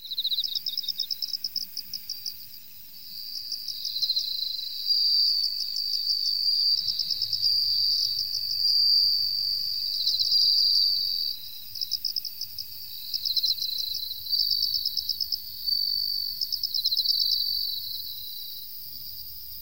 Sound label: Ambient Insects; Orchestrion; ffxiv